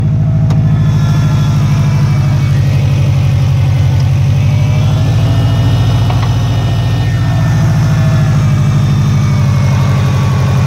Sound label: Piston Ticking Low Oil